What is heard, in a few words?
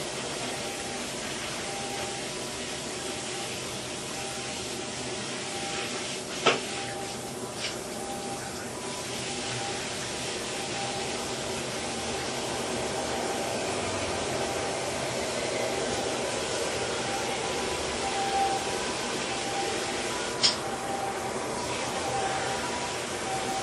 siren; siren-kyukyu x3 biggest